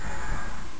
scrape; madcars2; radicalplay

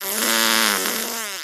fart

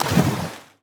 water splash; cross-code; move

